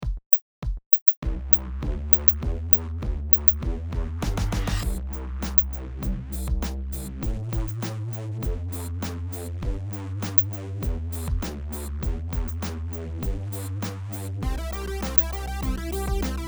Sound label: Not so silent Drums anymore